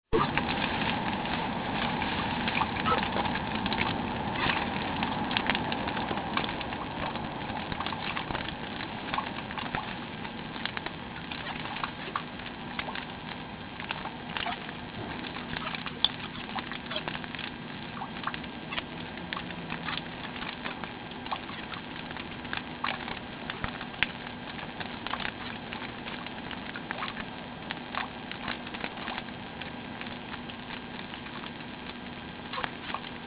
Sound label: water cell (rain)